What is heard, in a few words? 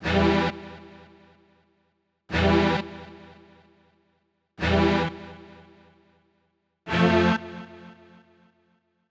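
Hip Hop Strings, Gmin, 105 bpm; Pro Tools Essential Loops; Digidesign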